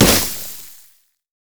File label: caustic spittle; LoL; kogmaw